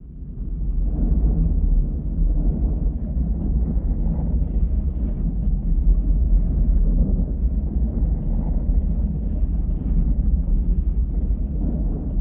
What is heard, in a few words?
SUB RUM, ROCK RUMB; Total Control - Action Cutz